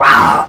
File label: imp pain, monsters; DoomRTX; imp5